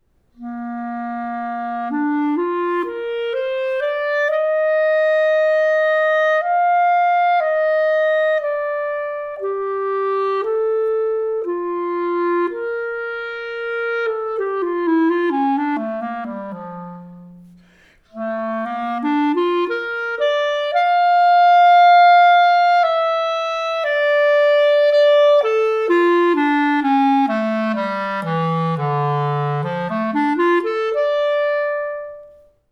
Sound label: Metal Clarinet